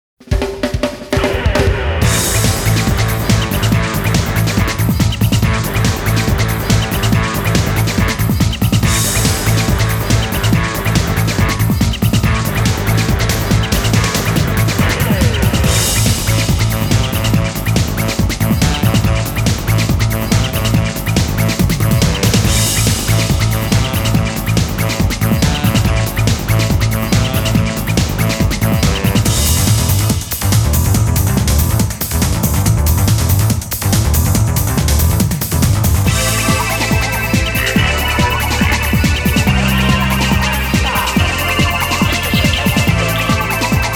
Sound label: Intense Fighting; Shin Sangokumusou; kyukyoku ongen; Sehki Feather